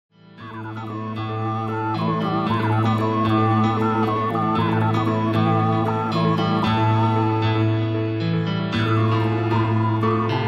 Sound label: piano